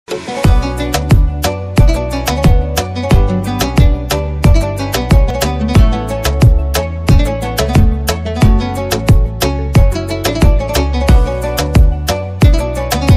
zehra instrumental ringtone new bgm ringtone attitude bgm ringtone